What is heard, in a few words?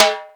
TIMB HI, PERCUSSION; AKAI S6000 CD-ROM - Volume 5; GM PERCUSSION KIT